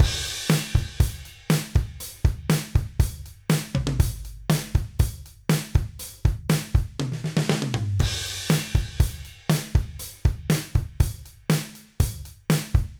Drums

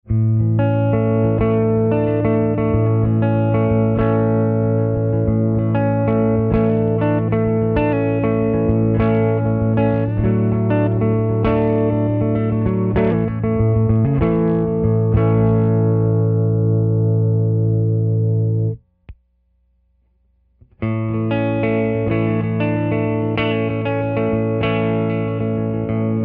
Bass Roll off

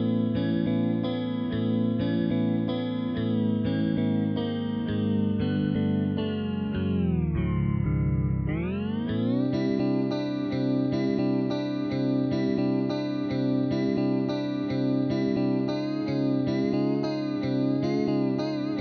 guitar tap freeze; Primal

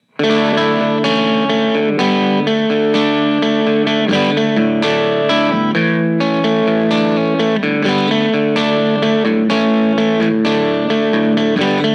jangle guitar; TPAF Amethyst Examples

